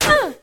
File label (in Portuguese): Dano